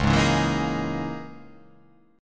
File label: Db Minor 6th Add 9th Db down; Dbm6add9 strummed; JGuitar